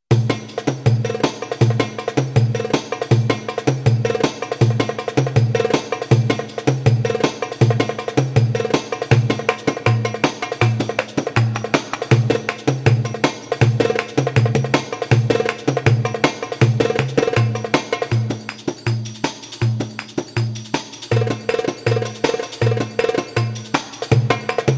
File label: psr a1000 tr